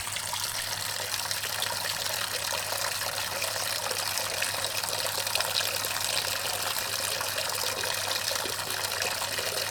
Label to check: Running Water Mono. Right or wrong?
Right